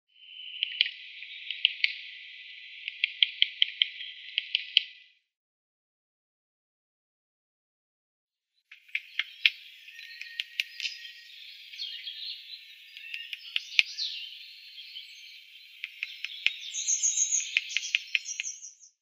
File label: Eurasian Nuthatch knocking